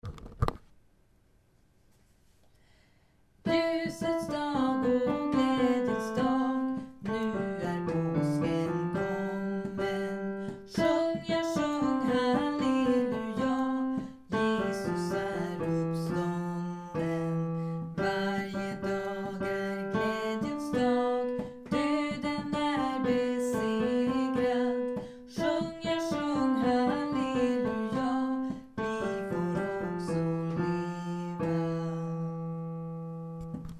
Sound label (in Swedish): tenor; gladjens dag